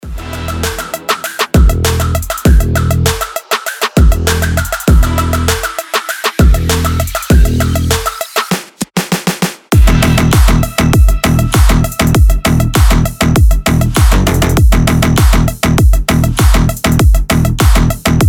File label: cyber trap